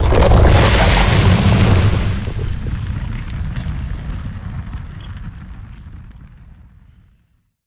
Mortar Cannon Flame Puddle; Evolve; Behemoth

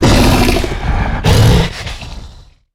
Pain Heavy; Evolve; Goliath

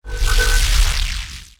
Grounder Glide; Evolve; Kraken

